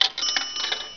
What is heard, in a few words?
DIAL